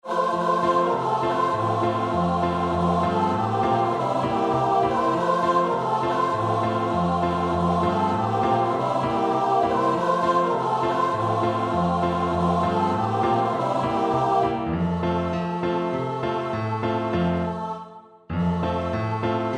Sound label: choir